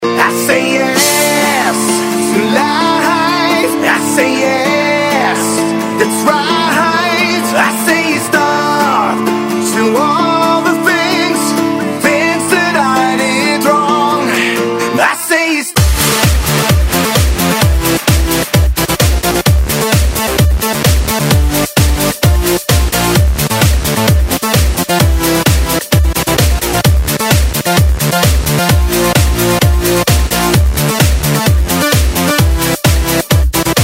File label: big room remix